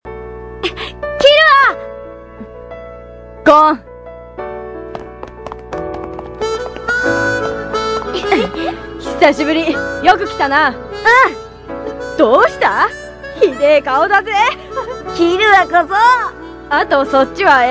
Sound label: harmonica; HxH